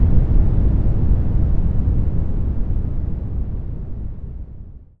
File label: explosion; Cannon